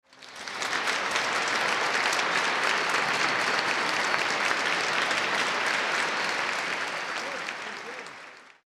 concert hall applause

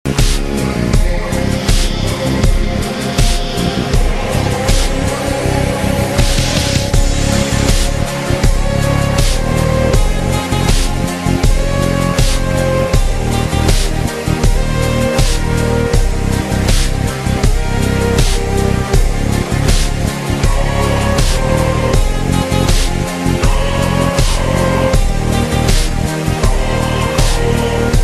Instrumental

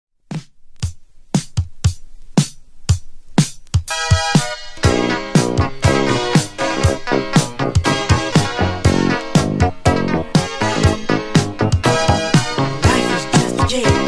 RARE FUNK BOOGIE

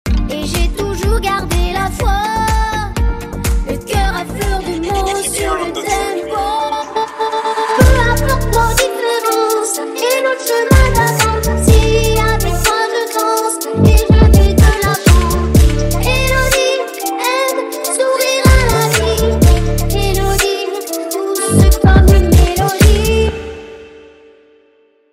Drill Remix

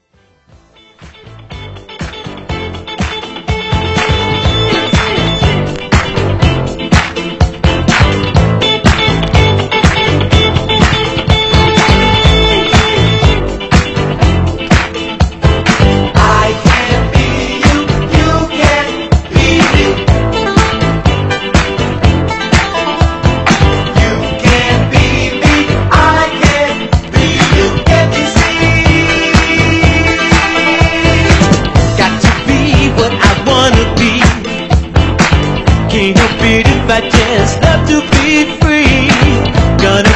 Funk

